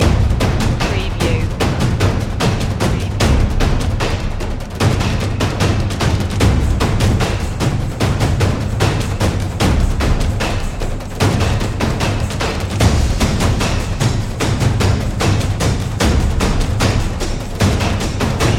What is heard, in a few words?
drums of destruction loop